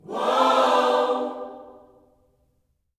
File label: WHOA OHS, Gospel, Vocal; Spectrasonics; Vocal Planet Vol.1 Gospel